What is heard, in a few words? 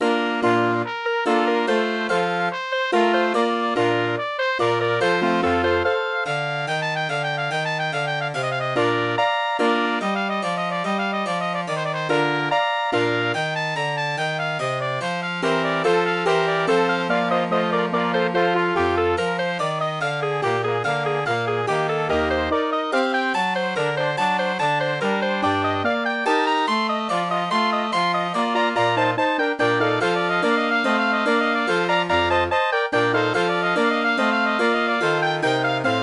MIDI